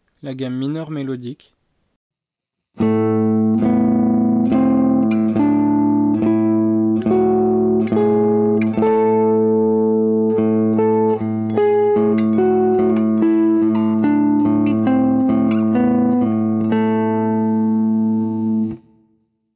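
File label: single String minor melodic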